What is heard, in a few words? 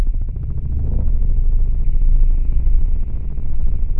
Plant Killing Low loop; Puddle; puddle plus ios gamerip 2018